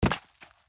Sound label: build concrete medium; Factorio